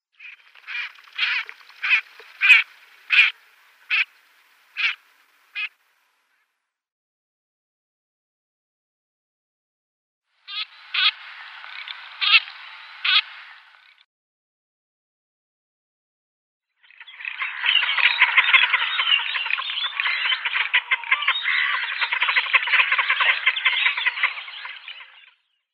Garganey females

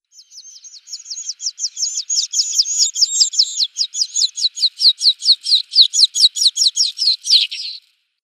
Alpine Accentor nestlings

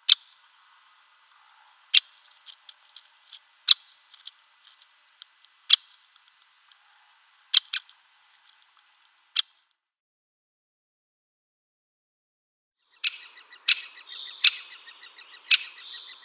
Great Spotted Woodpecker calls

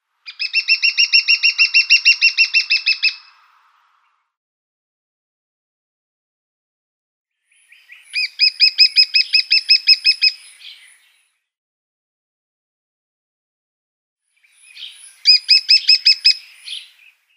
Lesser Spotted Woodpecker display

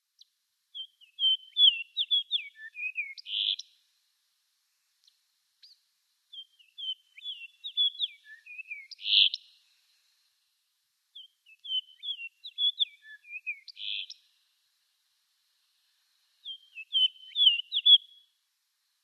Fox Sparrow song

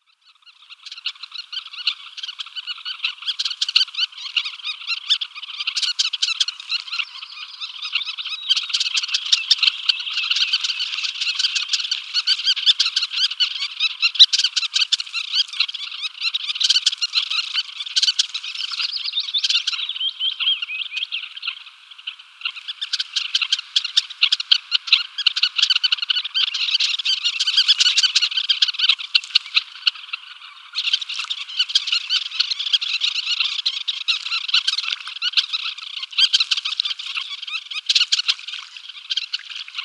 Red-necked Phalarope display

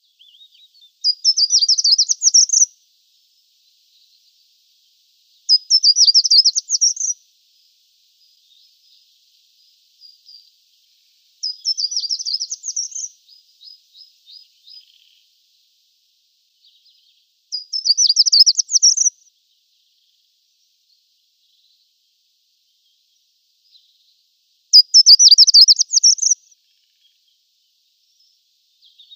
Short-toed Treecreeper mauritanica song

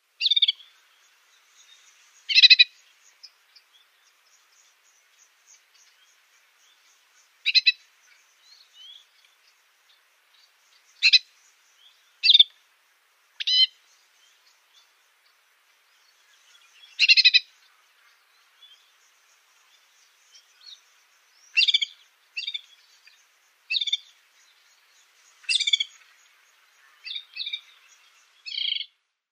Syrian Serin calls

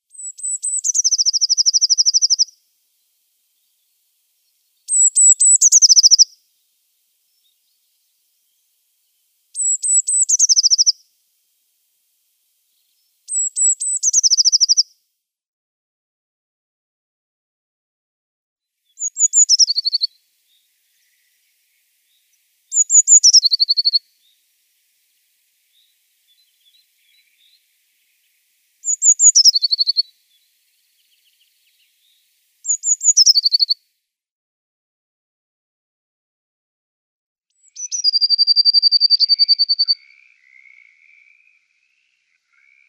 Eurasian Blue Tit song

